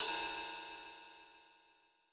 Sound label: Roland U220 Electric Set, Drum Machines